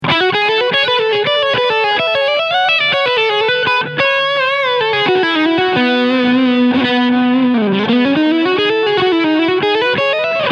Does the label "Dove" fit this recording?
No